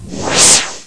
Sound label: Get Bonus Points; Monkey Shines 1.2.1; Fantasoft